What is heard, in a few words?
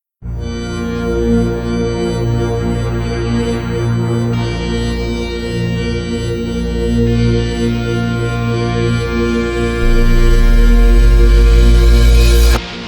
Eurobeat